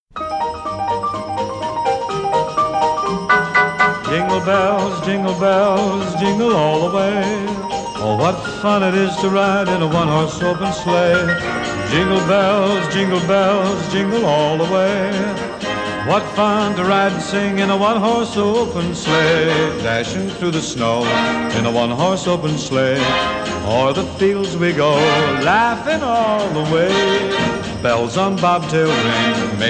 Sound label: Jingle Bells